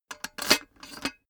cash box metal unlock; soundpool

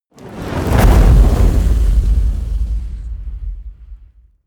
flame, explosionen; hits explosionen; impacts

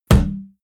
can heavy hit saturated

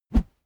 whoosh bamboo slow

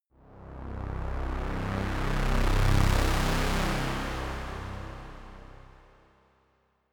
drone heavy saw bass swell short

